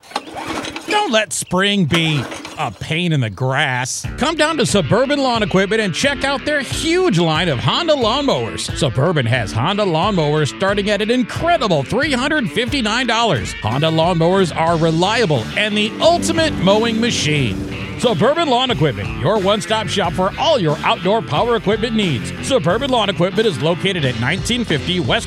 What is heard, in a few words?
HONDA lawn mower